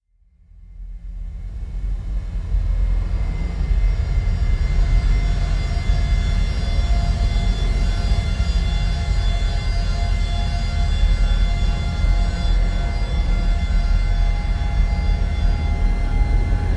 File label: drone eerie high tone